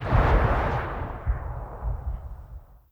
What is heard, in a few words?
tarantula fire echo